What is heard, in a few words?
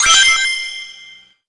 super ring; gameplay